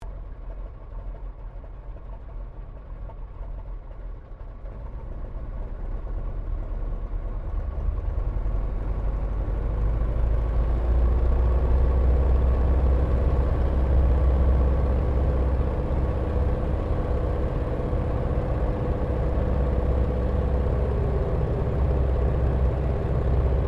AIRCRAFT 1916 SOPWITH PUP REPLICA TAKEOFF INTERIOR STEREO; Airplanes; Sound Effects:Vehicles